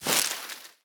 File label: reel Bounce; netent